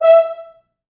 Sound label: Fr Horns stac